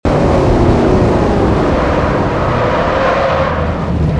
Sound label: throttle off, vehicles; 69camaro